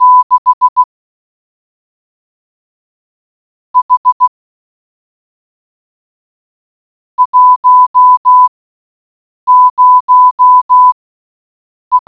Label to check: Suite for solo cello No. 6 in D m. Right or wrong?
Wrong